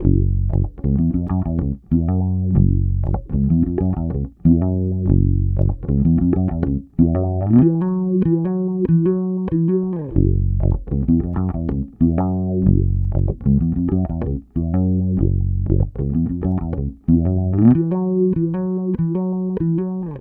Bass loop Groover 95 F#m, Electric Bass Loops Direct; Slate Digital BASSHOLE Sample Pack